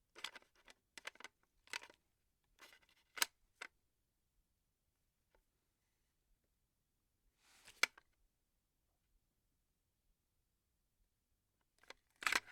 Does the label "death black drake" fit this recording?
No